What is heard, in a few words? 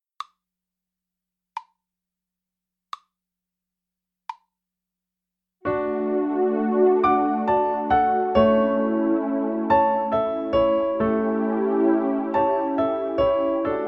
accompaniment, violin